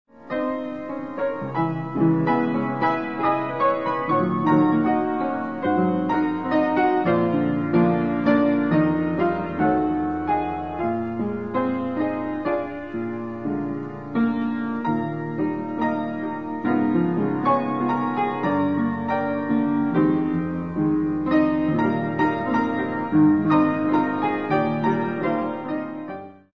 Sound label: Theme piano; Soldier of Fortune